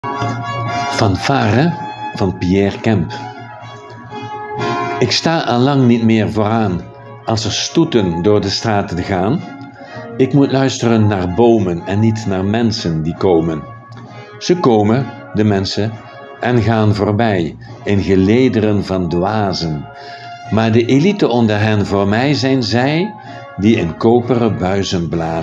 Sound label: Fanfare